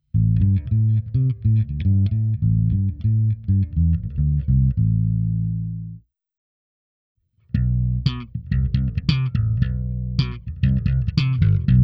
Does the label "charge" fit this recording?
No